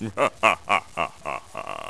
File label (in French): rire